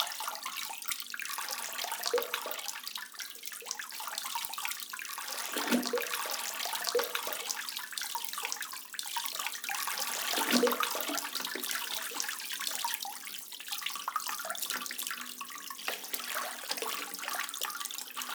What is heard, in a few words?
Almond Water Ambience; Backrooms 2D